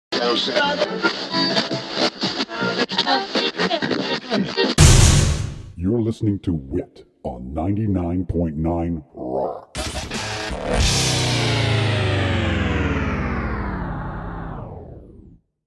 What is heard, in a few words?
Rock Example, Radio Station ID; Body Tone Multimedia